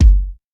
reverb bass drum single hit g key, Royality free bass drum sound tuned to the G note.